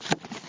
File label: cardboard move; talking tom gold run gamerip 2016